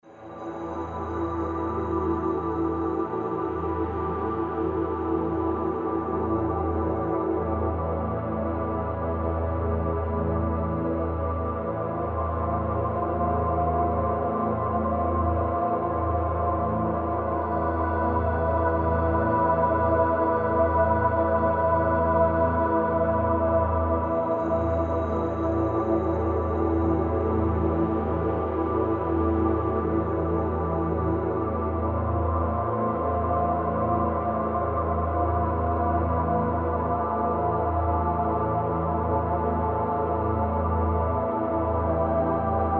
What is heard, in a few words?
Amb Sound Scape Layered chords, drones, Light choir; Outer Space; Ambient SoundScapes